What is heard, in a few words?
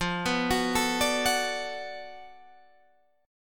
B Minor 7th F, chord; Bm7/F arpeggiated